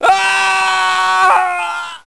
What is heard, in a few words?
scientist scream